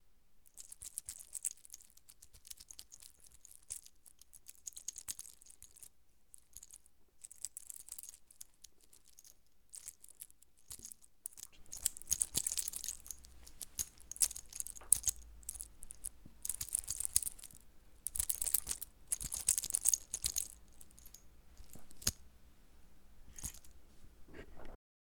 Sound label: Watch Shaking On Arm